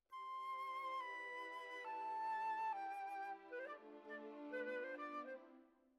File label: Flute